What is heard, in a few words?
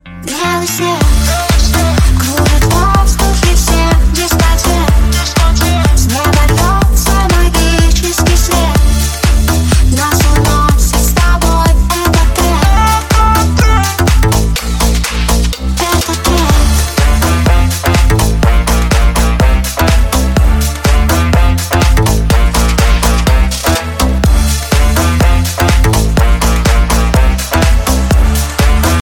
remix ringtone